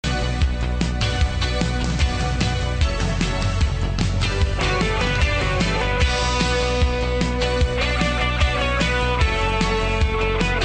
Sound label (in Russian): v stile disko